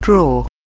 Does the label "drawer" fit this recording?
Yes